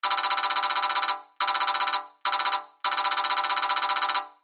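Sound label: electronic data transmission sounds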